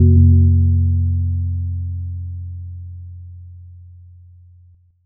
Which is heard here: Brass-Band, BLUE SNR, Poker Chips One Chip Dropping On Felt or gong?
gong